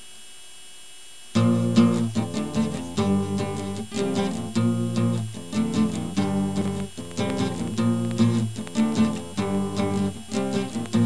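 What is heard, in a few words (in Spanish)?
Son solo unos fragmentos. Todas son tocadas con guitarra; Todas son tocadas con guitarra acustica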